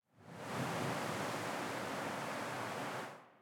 Victory Wind; Smite; Susano

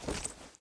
angelic step; smite; thanatos

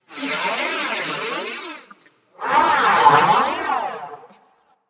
nv breath; smite; xbalanque

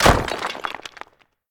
wall destroy; Smite; Cabrakan

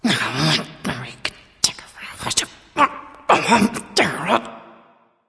grumble; smite; arachne

